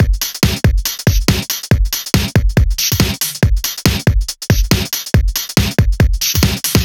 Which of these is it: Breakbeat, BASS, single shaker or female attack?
Breakbeat